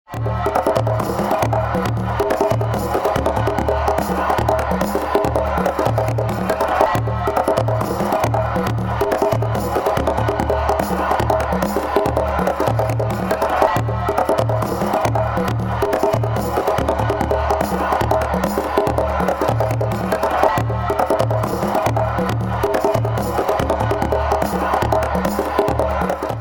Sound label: conga power station electric conga loop